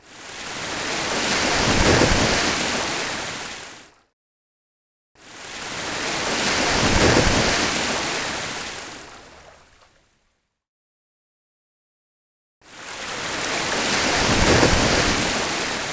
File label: Water Wave